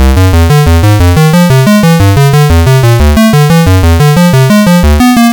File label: chromatic; music